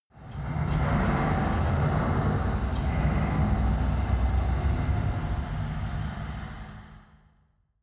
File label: mechanic ambience